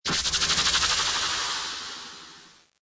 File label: cheers